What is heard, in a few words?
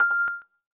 crystal lock; LG isai LGL22